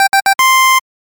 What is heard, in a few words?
Success